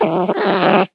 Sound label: GOB WARN